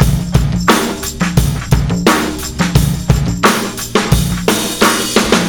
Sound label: Monsterfoot fill, Drums Beats